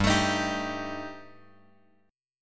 G Suspended 2nd Sharp 5th G; Gsus2#5; {3 X X 2 4 3} strummed

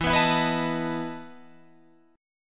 E Major 7th Suspended 4th, Guitar, Open F

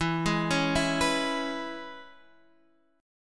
E 6th, Guitar, DADGAD; chord shape

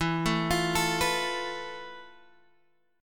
F Major Sharp 11th, E

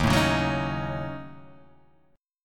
Eb Minor 7th Flat 5th, Guitar Half Step Down

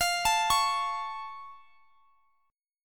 F Augmented, Guitar, Standard; arpeggiated; down; capo=3

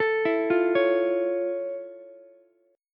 F Augmented Major 7th F Ukulele; F+M7; arpeggiated

